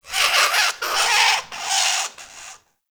Amb Corrupted Bird Close; Ambience; Mendicant Area

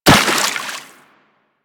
Run Water, Footsteps; Warframe; Operator

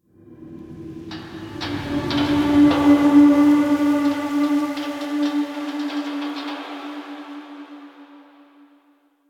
Park Wind; Ambience; Warframe; The New War; Quests; Zariman

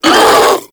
Infested Charger Pain; Warframe

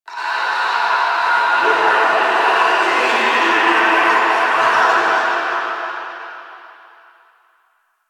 Infested Distant Sewer Grate Screams; Warframe; Ambience; Nineteen Ninety Nine; DE

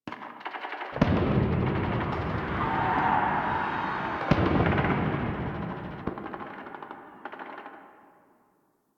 Ambient Distant Explosions; Infested; Warframe; NineteenNinetyNine

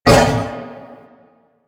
Wolf Attack Short; Animals; DE; Warframe; Quests; Duviri

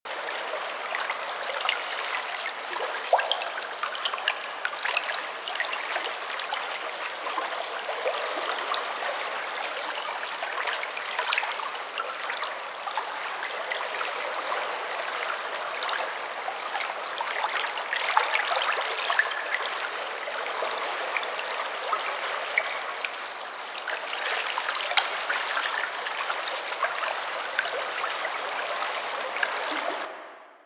Exterior Combat Water Lake Small Loop; Warframe; Duviri

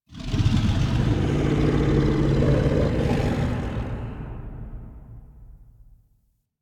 Idle Agile Speargun; Warframe; Voruna; Idles Agile

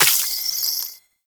Baby Resource Pick Up; Warframe; Nokko; Reroot

